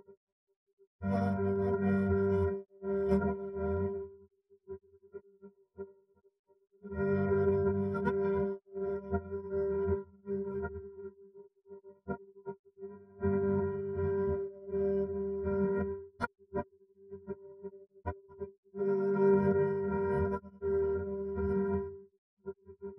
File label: piano; SiSEC11